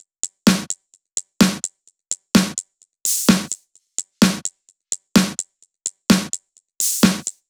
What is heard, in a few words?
128BPM Big beat Kit; Vengeance Samples